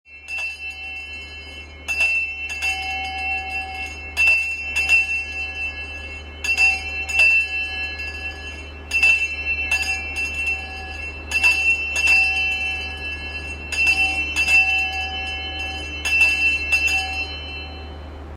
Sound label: Chelsea bell sound